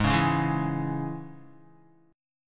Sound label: Ab Suspended 4th Sharp 5th, Guitar; 3,4,1,1,4,x; 4F,4A,5C,5G,5C,6E; strummed; JGuitar